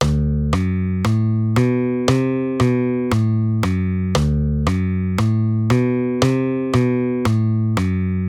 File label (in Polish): Figura tony D Fis A H c H Fis A